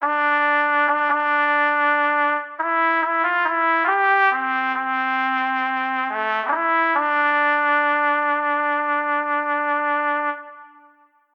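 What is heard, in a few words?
Lose, game music; Project Reality BF2 1.6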